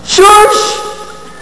shush

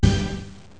ORCH HIT

